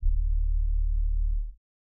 808's

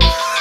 Hit

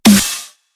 SNARE; SKRILLEX 2010 SNARE

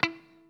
PICK

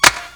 Kicks

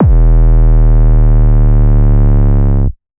808 E; Cymatics

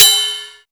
Cymbal

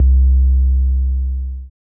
808 MID

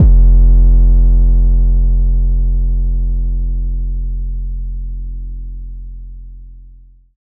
808 F; Cymatics

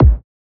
Kick - G#; Cymatics - Astro Caffeine

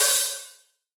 Live Hat, Closed Hats

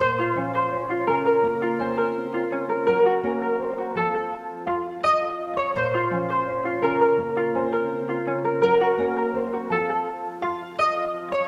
BPM 83

